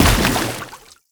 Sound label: Step Water, Footsteps